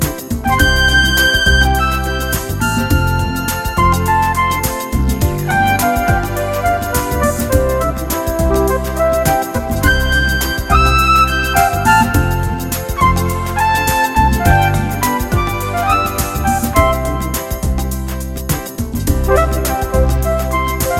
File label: Pop Bossa Nova